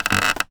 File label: Polystyrene Creak